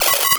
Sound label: Fx Glitch; Fx Glitch Communications